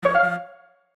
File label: ring